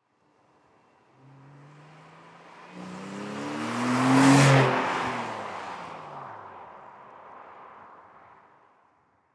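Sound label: sports car exterior passing by fast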